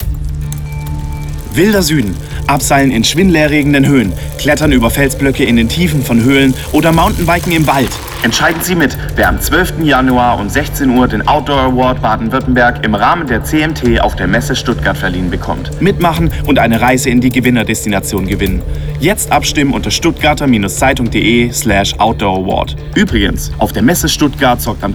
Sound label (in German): Outdoor Mit Musik